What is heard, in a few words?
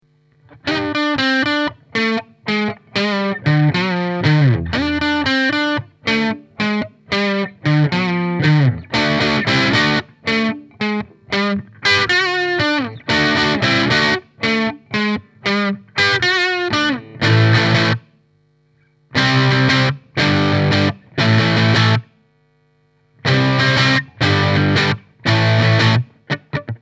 Strat Crunch; lampes